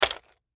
Bullet Shell; Bullet Shell 45 Drop Debris; Drops (Sound Effects:Objects); Blastwave; Warfare

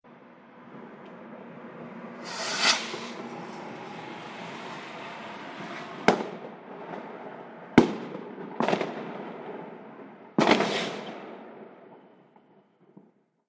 Fireworks Car Passes As Rocket Is Launched; Firework Sound Effect

